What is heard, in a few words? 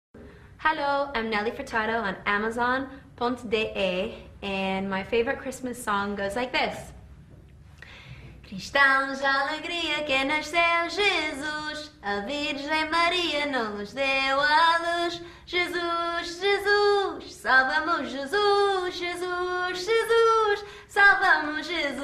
Sings Christmas Song